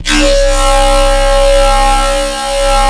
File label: Phaserus, Noise Osc; Cymatics; Shapeshift